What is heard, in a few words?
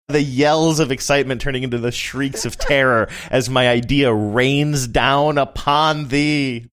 yells of excitement turning into shrieks of terror